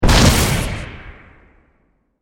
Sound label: Horror Magic Spell Explosion Poof Whoosh Impact